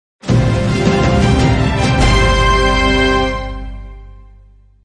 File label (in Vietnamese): nhac nen gioi thieu nhan vat